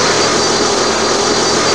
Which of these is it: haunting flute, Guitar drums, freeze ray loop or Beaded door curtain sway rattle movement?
freeze ray loop